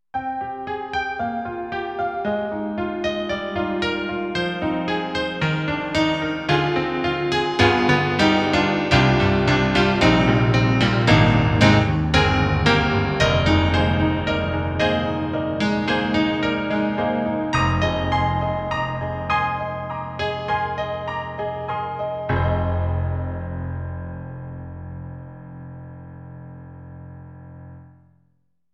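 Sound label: SD-90 Enhanced Bright Honky; EDIROL; Brite Honky; Brite Honkyw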